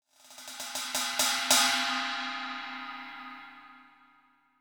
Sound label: ride